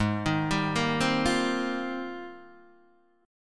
G sharp Major 13th, Guitar, DADGAD

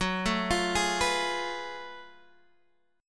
F sharp Minor Major 11th, Guitar, Drop D; chordshape; down

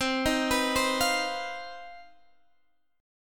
C Minor Major 11th C down Guitar Half Step Down; CmM11

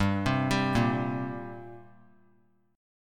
G Minor Double Flat 5th, G; arpeggiated; JGuitar

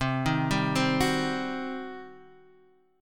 G Major 7th Suspended 4th Sharp 5th C

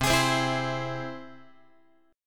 C Augmented C down Guitar; strummed; x,8,11,10,8,11; 4A,4E,5A,5D,5G#,6C#; JGuitar

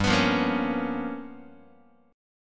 G 7th Sharp 9th Flat 5th G chord, Guitar; G7#9b5 strummed; JGuitar

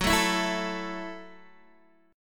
Gb Minor 7th Gb, Guitar Open D; down; chord shape

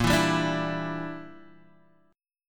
A sharp 6th Flat 5th, Guitar Half Step Down

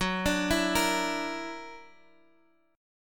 Gb Augmented 7th, down, Guitar, Half Step Down

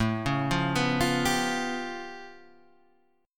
A Augmented 9th A, Guitar Standard; arpeggiated; JGuitar

